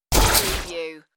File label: space door unlock